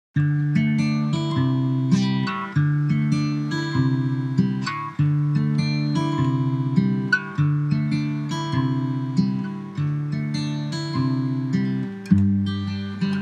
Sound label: gtr